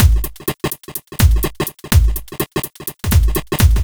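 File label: Disco No snare, Drum Loops 125bpm, Nu Dance Electro; Disco2Disco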